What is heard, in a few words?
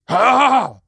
worm charge